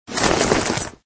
Bag Rustle; Extra Lives; Android

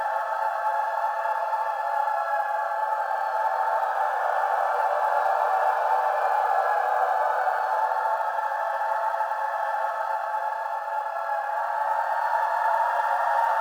Women choir loop

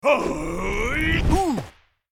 Character Black Rageattack; Angry Birds Epic

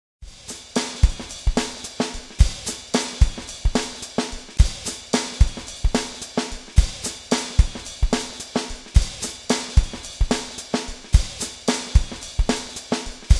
1 million monkey drummers; Crashed Lander

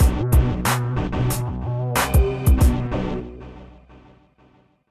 Action

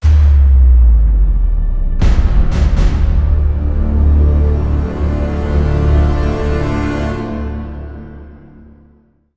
Final Fight Outro; Revelations

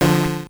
multiplier D4 F4

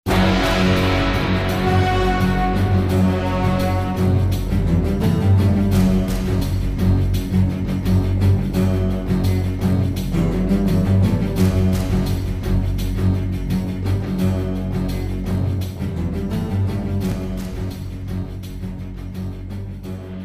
Success; Scen Bgm Alamo; Counter-Strike Nexon: Zombie

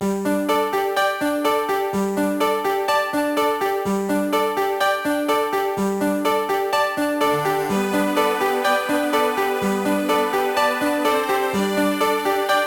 BGM MENU

